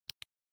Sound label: Pen att noti; 三星note10手机系统铃声